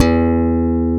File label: POP E2, bass; AKAI S6000 CD-ROM - Ultimate